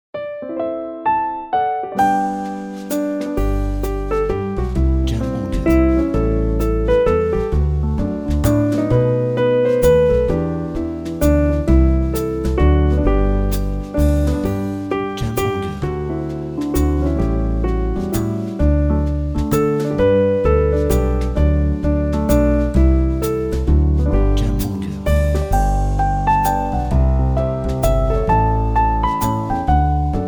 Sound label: Christmas Jazz